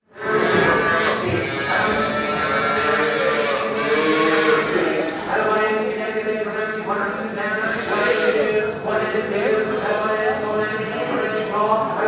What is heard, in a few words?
Calf Auction, interior, with lots of animal noise; Cows; BBC